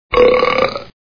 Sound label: BELCH